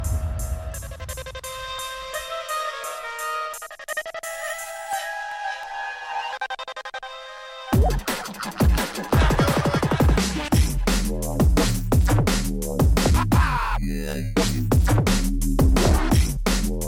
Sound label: metal flange cold